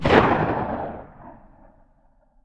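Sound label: shotgun exterior shot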